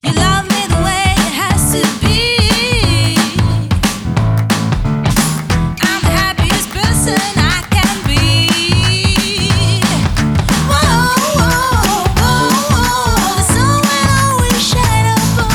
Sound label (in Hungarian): tippmix promocios zene